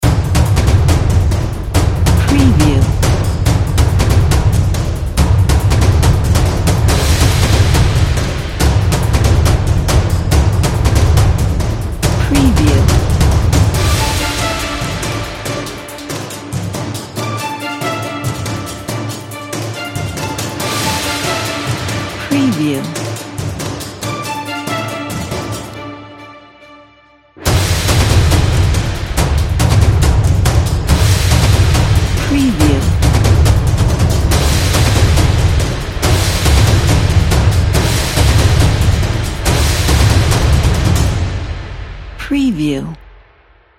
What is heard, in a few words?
cinematic epic violin trailer